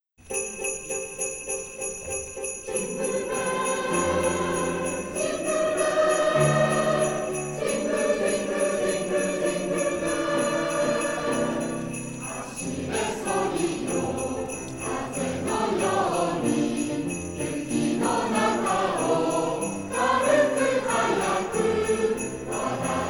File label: Jingle Bells; 合同演奏; ジングルベル J.ピアポイント